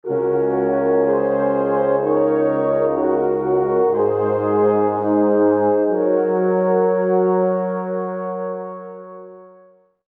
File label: Med Calm; Sid Meier's Civilization Revolution 2; vita